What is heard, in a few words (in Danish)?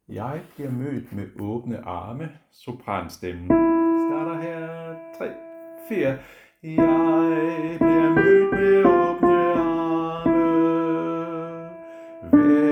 sopran; harlev koret